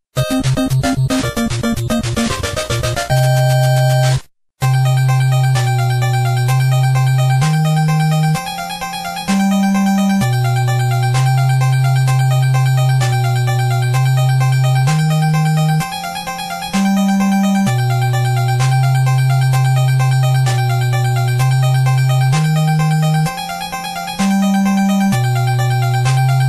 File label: boss battle; nes; castlevania; 1986; konami